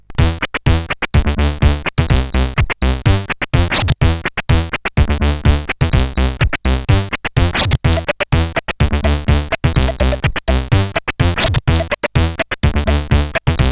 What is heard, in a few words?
Human Box, CSG8580; CSG8580R5